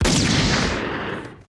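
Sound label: laser gun; Total Control - Action Cutz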